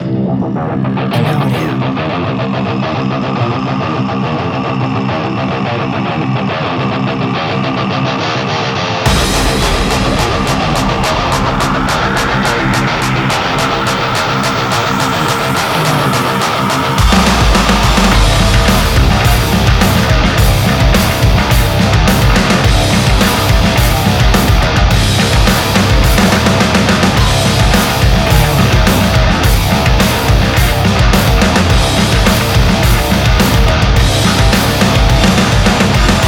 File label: 212 BPM Cm; Independence Tunes